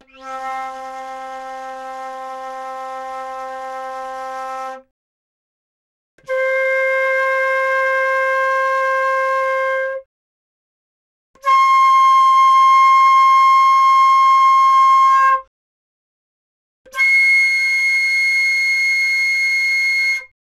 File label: Flute; 気鳴楽器; AirReed